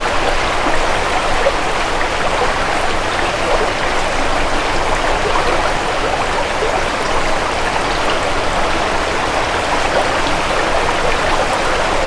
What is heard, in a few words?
water river fast; sound effects one